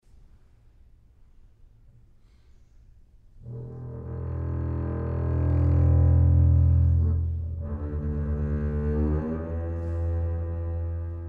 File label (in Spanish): micromusicas para contrabajo